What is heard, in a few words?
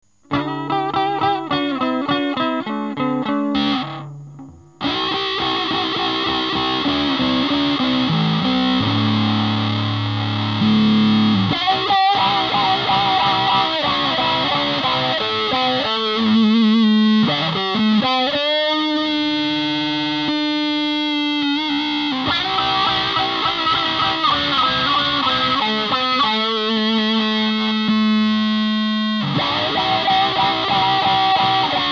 MUTRON FUZZ; MICROWAH